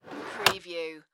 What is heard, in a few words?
Desk Keyboard Drawer